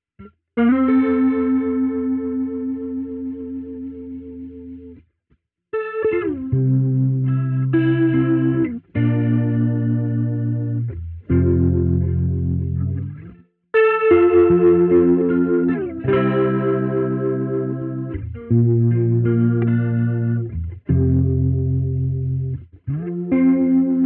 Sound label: tremolo guitar